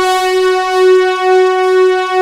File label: BIG MOOG; East West - Global Sounds